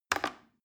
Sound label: plastic button little click regular fast